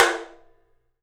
OPEN TOM; 3D Sound; 3D Drums

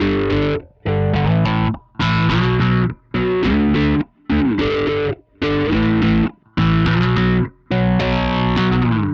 Bass, Indie Rock; Sonic Foundry (Sony Creative Software); Indie Rock Anthems

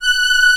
ST SA, SYNTHESIZER ANA STRINGS

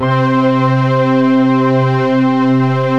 MLT TRK JX3P

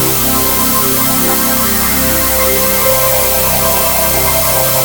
lead; hashyspad; Sonic Foundry (Sony Creative Software); Ugly Remnants VOL-2; Leads

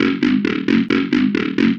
Acid Squelch, Bass

